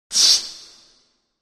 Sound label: Air Release Hiss Rev